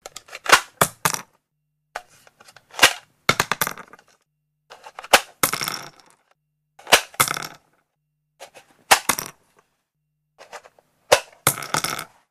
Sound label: Eject Ammo From Clip, handgun